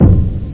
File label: knock bassdrum